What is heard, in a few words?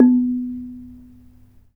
RANAT THUN B3; Spectrasonics; Heart of Asia